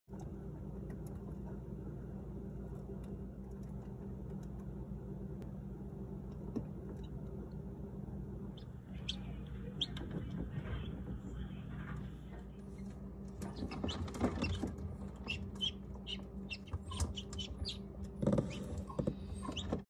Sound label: Hatching chick from egg